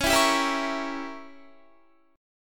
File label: Db Diminished 7th Db down Guitar; strummed